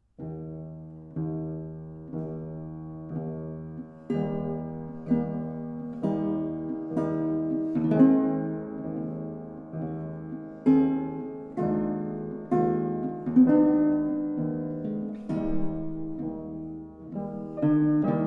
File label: duet; hummel